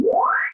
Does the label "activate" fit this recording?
Yes